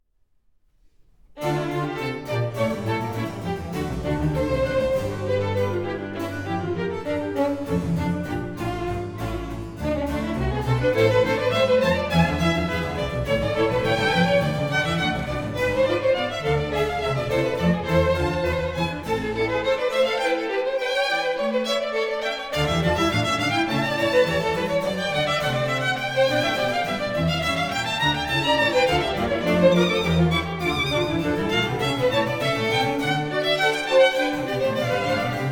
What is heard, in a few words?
Concerto for 2 Violins, Strings